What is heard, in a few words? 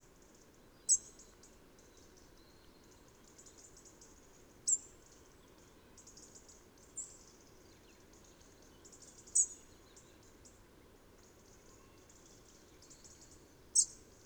dawn tsi calls